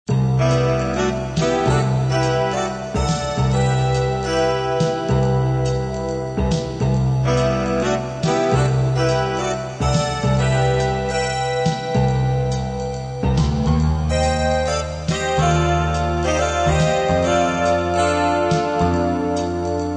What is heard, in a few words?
triad